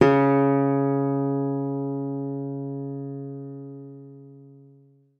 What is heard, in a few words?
GUITAR C#3; Xsample VOL-2